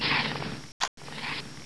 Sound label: plasma switch, weapons; XDM